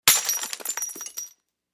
Saucer Smash, china

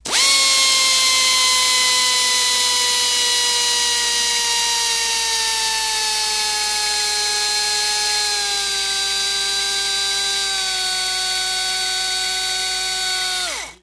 Saw Air Tool Epiderm, saws

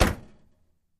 Camaro Door Close, chevy camaro

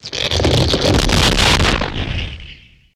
Earthquake Crackle

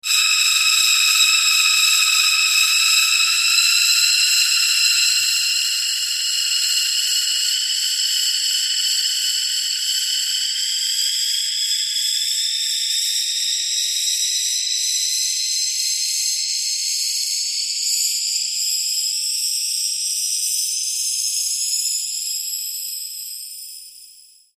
Gas Release Glass Tub

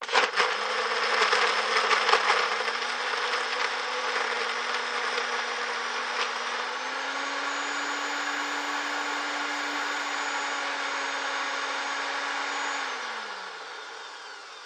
Blender Medium high S; appliances

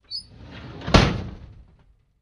Drawer Metal Close; drawers

